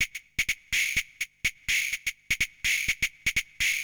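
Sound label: percussion, house, 125, drum; eJay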